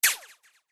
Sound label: notif danger